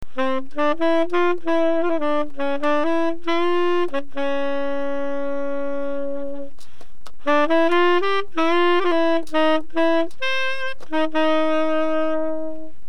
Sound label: bossa